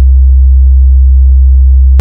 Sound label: Audio frequency tone, C2, 65.41hz